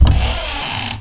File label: open; quake2; coop